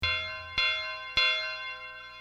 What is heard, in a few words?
Bells